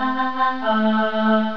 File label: TATATAA